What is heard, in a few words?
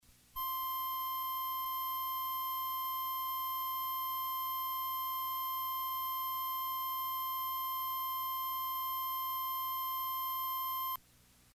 autotuning decks tone kx580